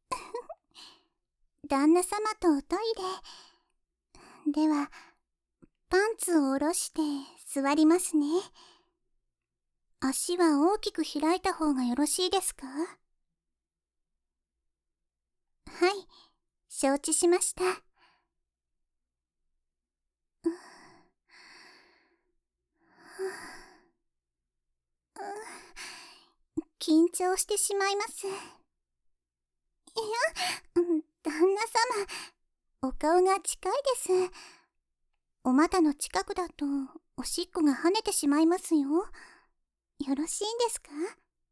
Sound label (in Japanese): おしっこ